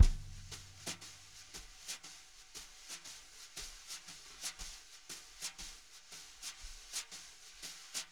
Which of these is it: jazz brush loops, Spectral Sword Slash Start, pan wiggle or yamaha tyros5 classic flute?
jazz brush loops